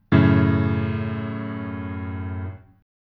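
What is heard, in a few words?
F sharp minor lowest, chords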